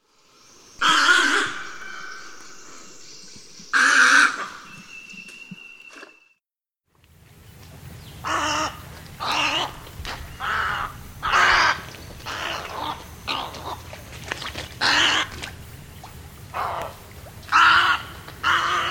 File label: Snowy Egret